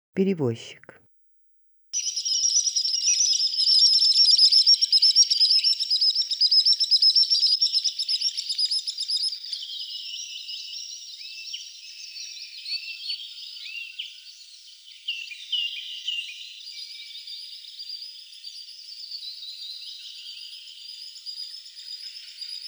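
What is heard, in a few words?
perevozchik golos, common sandpiper voice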